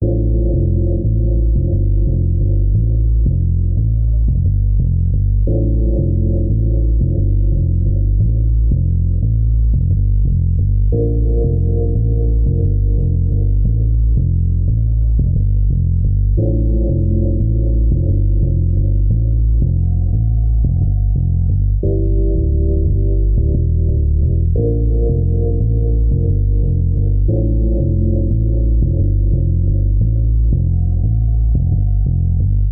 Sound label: bass, Sad